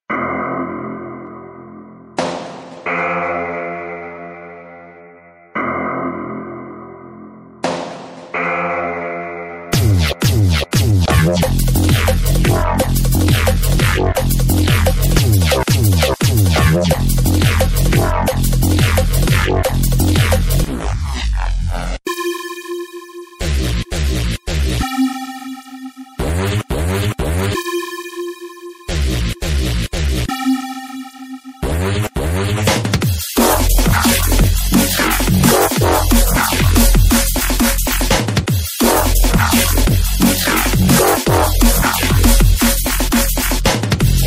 NEURO DNB

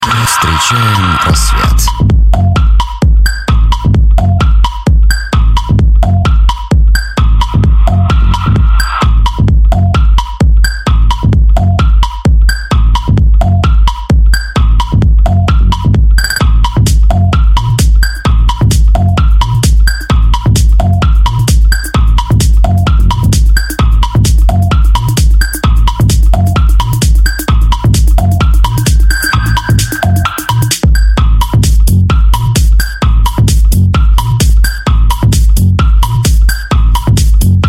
Minimal

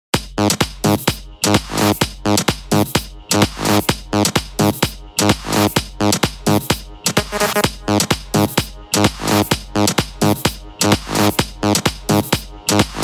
Dutch House

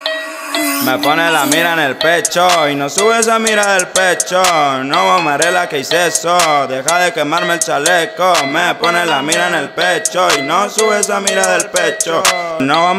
Trap

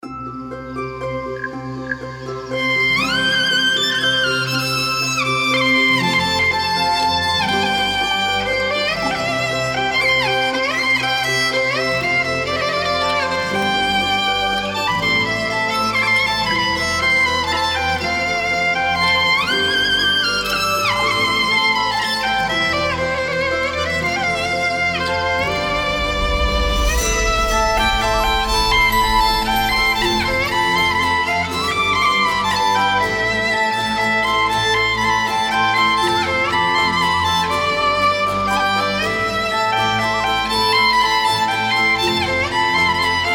violin

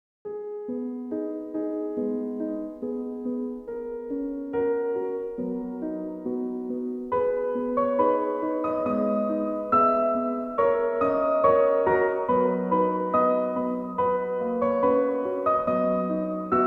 Relaxing Piano Music

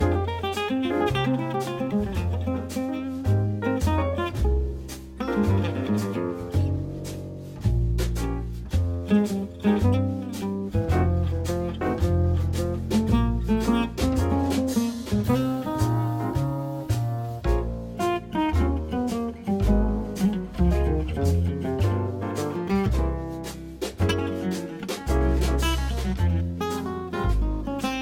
Jazz Manouche On the Streets

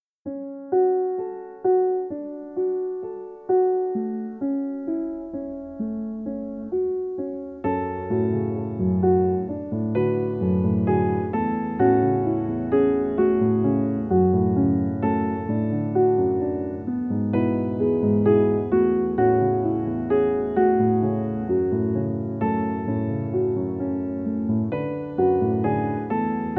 Peaceful Piano

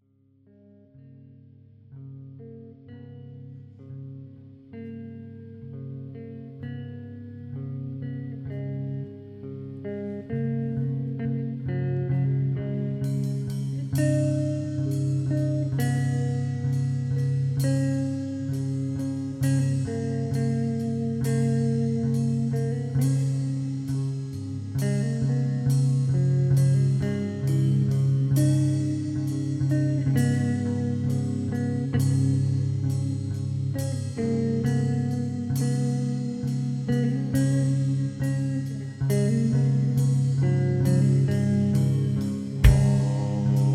icelandic folk song

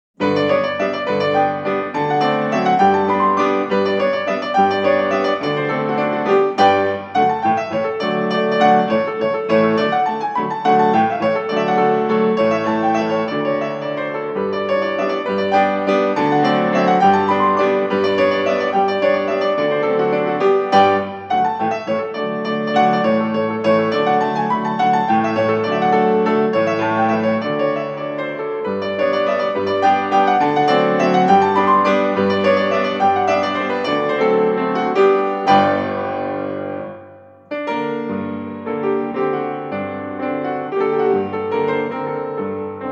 Mazurka sol mazhor; Oginskij

